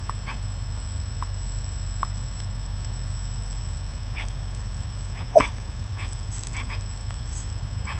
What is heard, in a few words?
a dogs tongue flicker